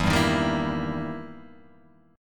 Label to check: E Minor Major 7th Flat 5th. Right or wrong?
Right